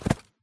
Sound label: grass land; Golden Crayon Sounds